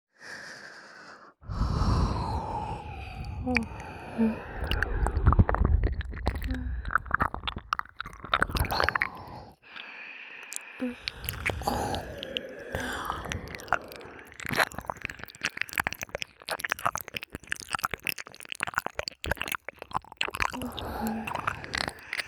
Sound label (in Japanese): 耳舐め両耳